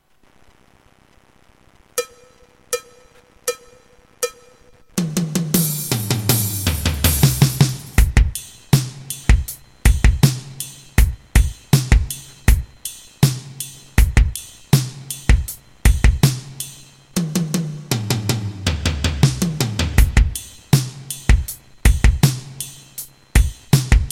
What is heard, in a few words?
rock etuede